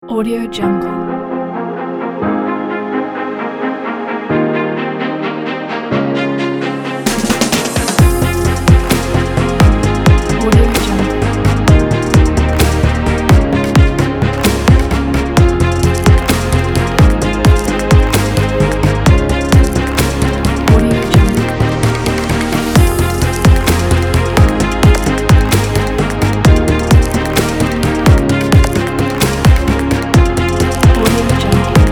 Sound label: technology epic corporate; pumatunes